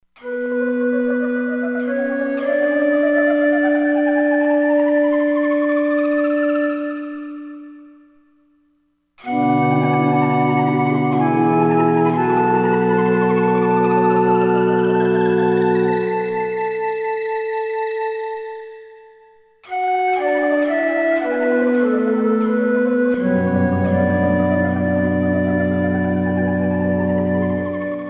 Manta Flute, Korg MSC-16S; Environment

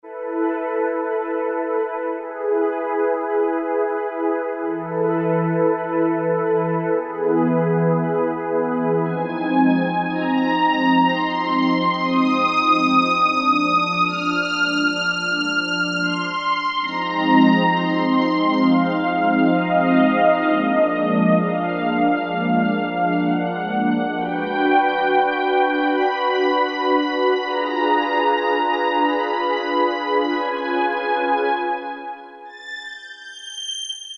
Analog pad, Korg T3

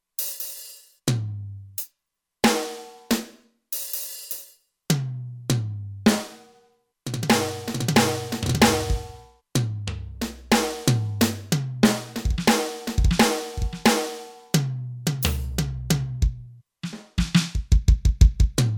Studio Kit, Kurzweil K2500X; Factory Programs

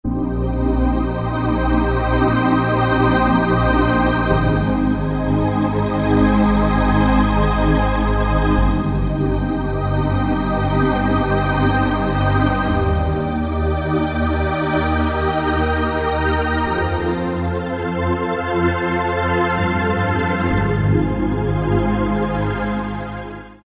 Nuage, Yamaha TG500

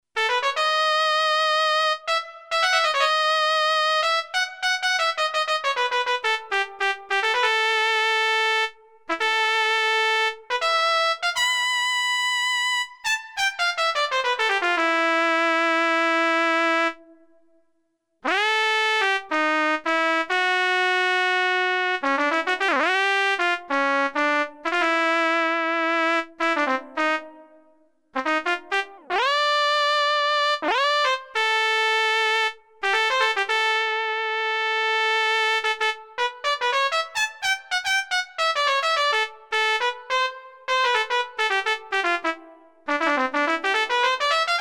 Solo Trumpet

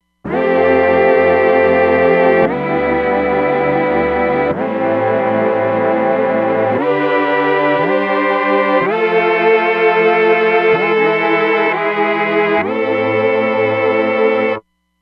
Mellow Tron

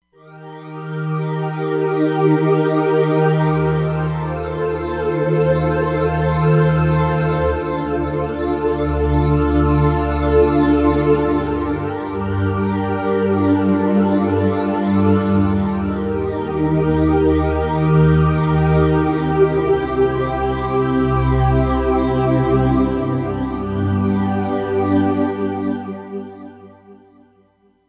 Flange Dream; Roland Fantom XR

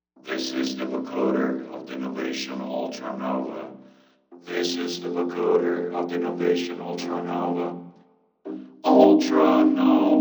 Vocoder Mic; Novation UltraNova; Factory Patches

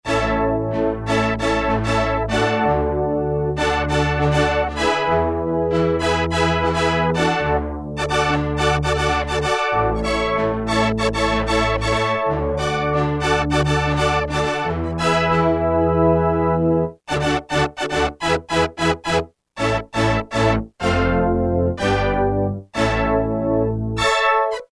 Tri dent Brs; Roland M-DC1

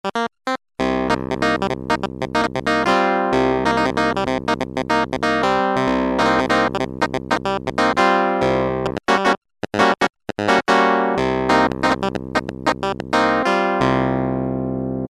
Clav, Roland Juno-106; Factory Preset

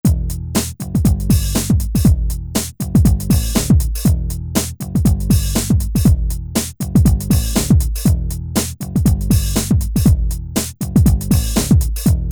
Rap Hip Hop

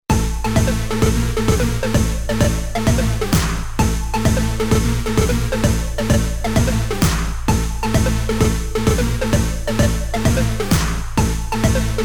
EDM

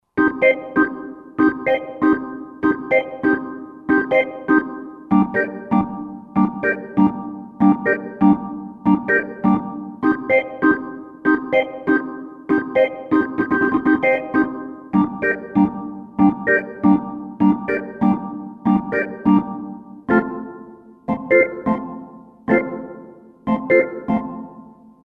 Reggae Organ; '60s & '70s; Roland SR-JV80-08

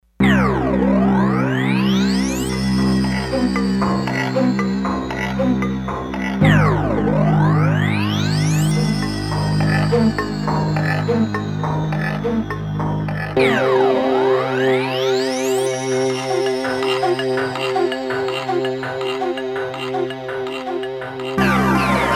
Native Dance, Roland D-10; Factory Patches

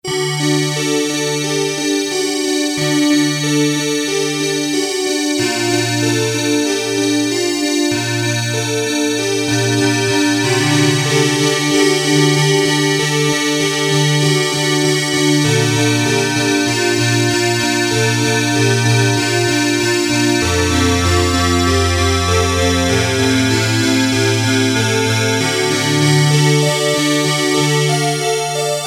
Synth Ens, Casio CT-370; Tones

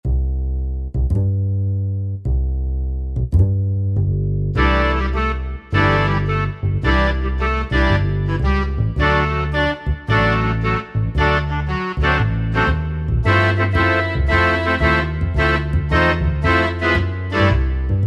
Moonlight Split, Korg TR-Rack

